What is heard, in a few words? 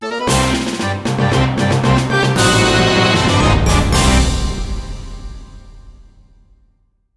Retire. -Game over- (Game Over); Trouble Witches